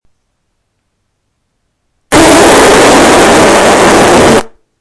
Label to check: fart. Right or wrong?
Right